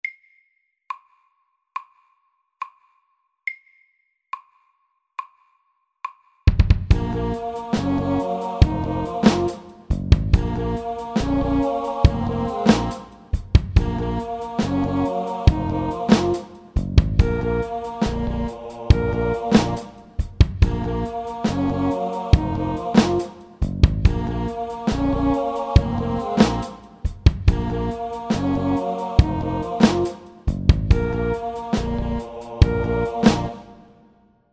Octave rap MIDDELS

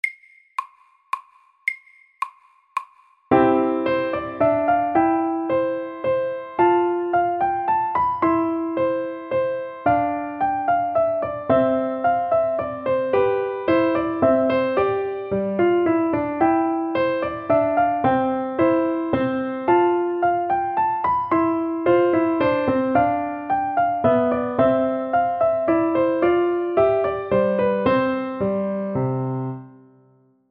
Menuett MIDDELS

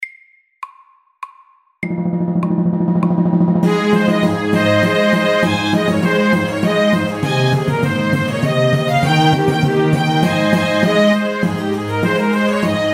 Air de trompette FORT

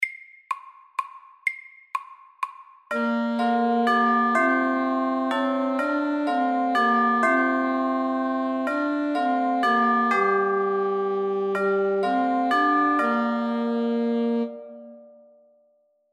Klokkekling FAST 125

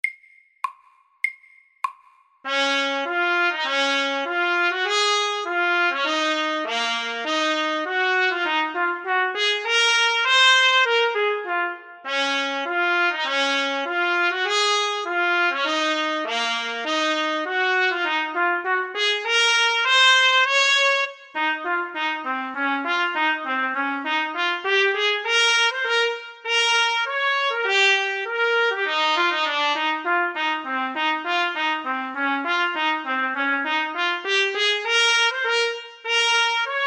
Etyde i Ess dur MIDDELS; Clarke

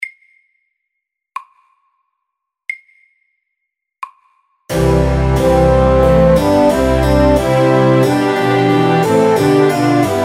Trumpet tune SAKTE

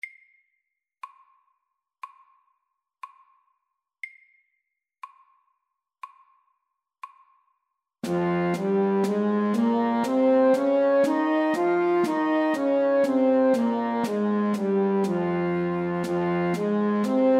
F moll natura SAKTE

